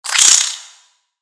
Spider Angry

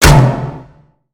Robot Get Hit Dry; creatures mechanical; Lego

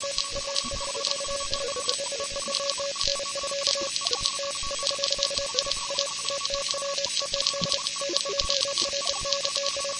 Broken Computer Console; destructibles

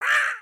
Parrot Squawk; creatures organic

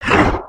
Run, creatures organic

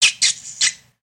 Pet Bunny Happy; Lego; build mode

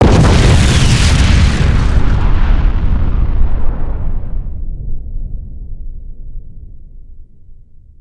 ship explosion; destructibles

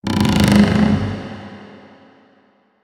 Cave Wood Creek; ambience ninjago

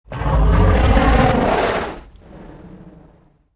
Dragon Enter

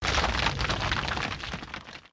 rubble; creatures organic

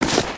Pet Warthog Dig; creatures pets

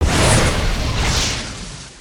fireball; creatures organic

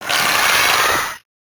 Spider Boss UP Yell; creatures mechanical

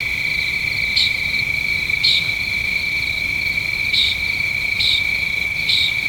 night bird loop; ambience

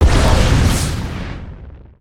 fireball; creatures organic

